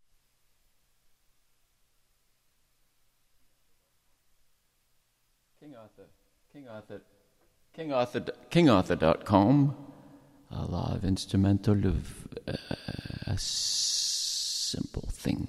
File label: Simple Sing Instrumental